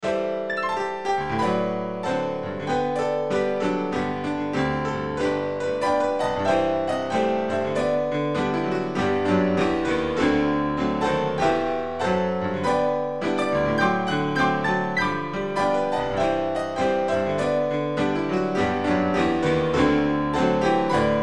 instrumental hymns; pass me not o gentle saviour

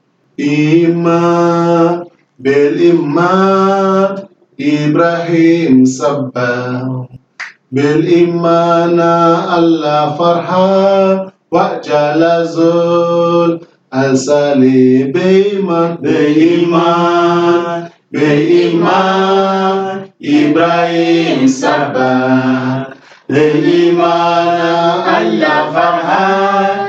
faith of abraham, chadian arabic, songs; iman

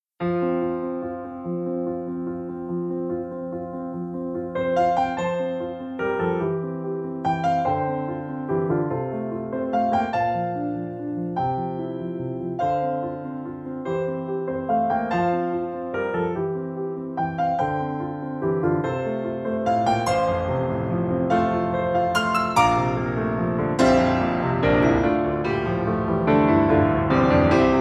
piano

